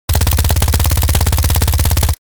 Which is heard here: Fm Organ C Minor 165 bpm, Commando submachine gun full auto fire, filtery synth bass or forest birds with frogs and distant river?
Commando submachine gun full auto fire